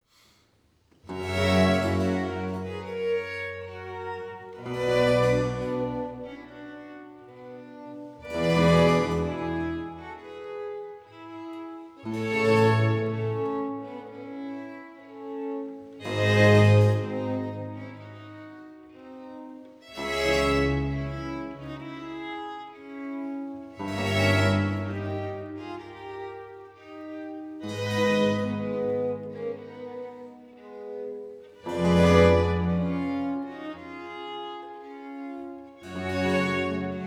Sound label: Concerto in B flat Major; RV 162